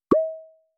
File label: Tonic Panned Electronic Udu Tabla Drum